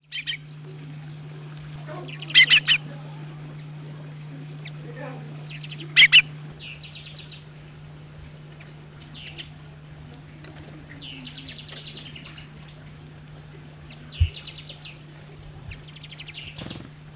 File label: Madeira Chaffinch calls